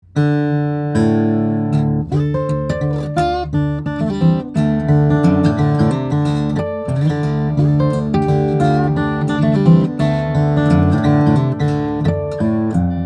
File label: Jumbo, guitars; olson; brazilian rosewood; spruce; flattop